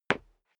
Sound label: step sneaker concrete single stair down; schritte